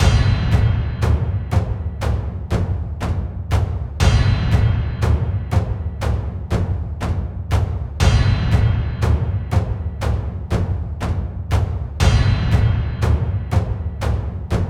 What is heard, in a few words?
battle layer